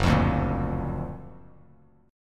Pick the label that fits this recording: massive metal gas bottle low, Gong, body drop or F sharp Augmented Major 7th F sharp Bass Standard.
F sharp Augmented Major 7th F sharp Bass Standard